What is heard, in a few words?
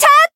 Voice attack; komachi